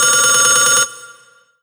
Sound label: Roland SC-88 Telephone Ring